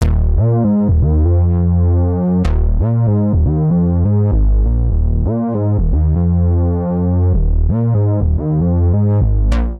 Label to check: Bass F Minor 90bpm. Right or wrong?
Right